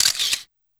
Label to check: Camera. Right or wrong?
Right